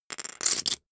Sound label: poker chip stack big rattle